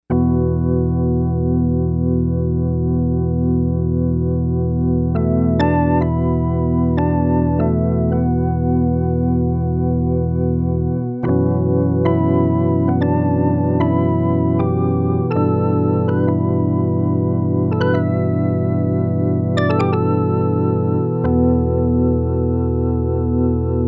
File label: Phases